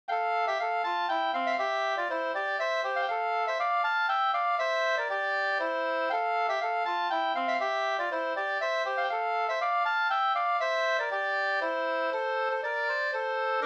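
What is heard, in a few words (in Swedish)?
polska, tvåstämmig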